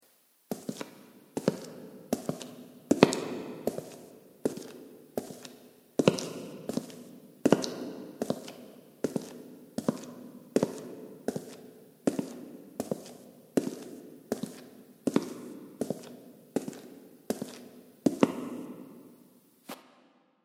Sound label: footsteps